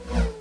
Send File

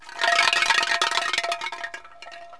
can drag; Wired for Sound Pro CD; Aristosoft, Inc.

